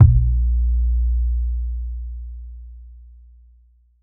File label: tr 808 synth drum kick a sharp key; kick drum samples